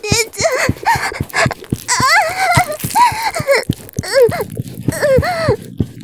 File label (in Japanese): 絶頂 潮吹き; 絶頂+潮吹き+中出し ランダム再生